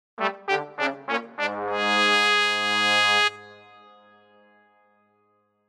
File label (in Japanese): デーモン召喚